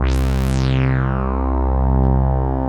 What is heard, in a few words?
resonant filter